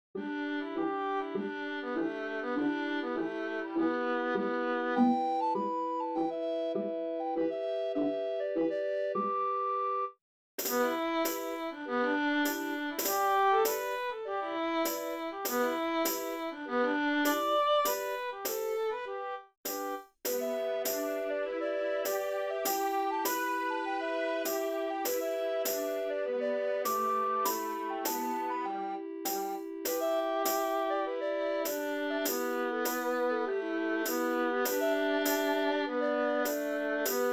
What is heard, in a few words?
strathspey recorders